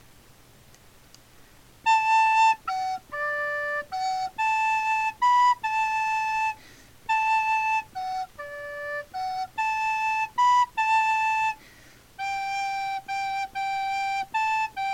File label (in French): flute